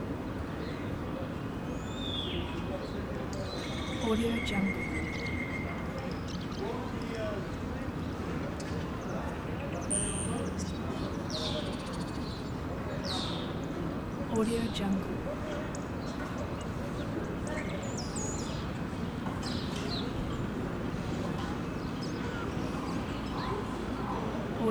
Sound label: town morning rooftop ambience portugal